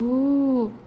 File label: uuuu